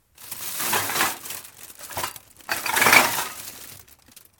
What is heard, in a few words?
bottles cans, ビン・缶捨てる